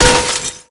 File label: Armour Helmet Hit Shatter; SFX Kit; Creaks, Scrapes, & Misc